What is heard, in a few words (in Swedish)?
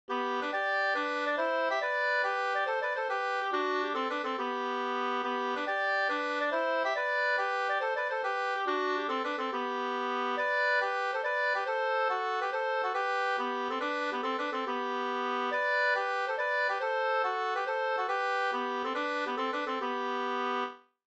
polska Hamburgerpolska Stockholm